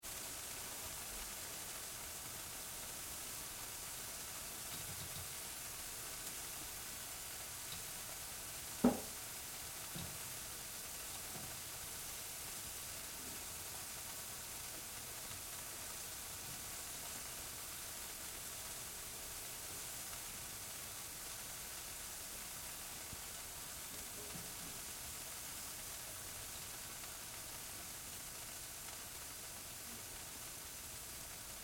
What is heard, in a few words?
Food Sizzle Oven Chicken Steady; Household; BigRoomSound